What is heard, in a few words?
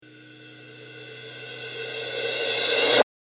cymbal rev